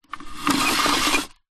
household washing line retractable pull extend plastic housing